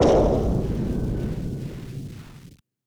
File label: gun shot synth pad lead bang